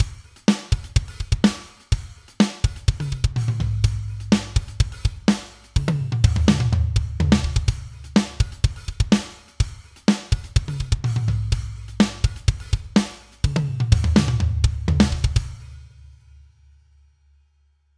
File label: Drums Metal Kit Thick Metal; Steven Slate Drums 5; Deluxe 2